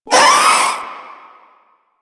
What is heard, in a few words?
Proto Infested vocal short attack